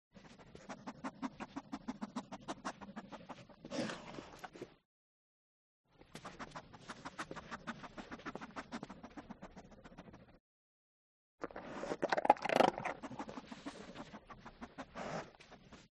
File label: DOG Stafford Eat Sniff; ANIMAL; smartsound